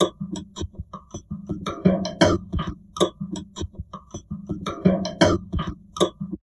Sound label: TALK, Drum Loops Crazy Processed